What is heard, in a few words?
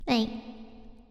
oi reverb